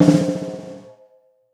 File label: Steel Long Drag, Snares; Drums; Ghosthack - Free Snares 2023